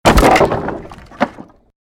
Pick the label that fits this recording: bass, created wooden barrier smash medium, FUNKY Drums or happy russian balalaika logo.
created wooden barrier smash medium